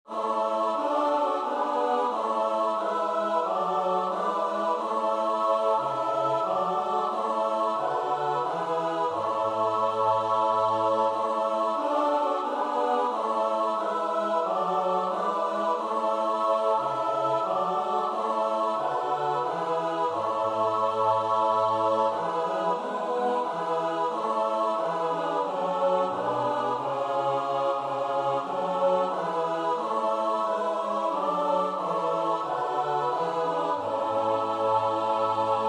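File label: soprano alto tenor bass; Bach (Johann Sebastiaan); BWV 067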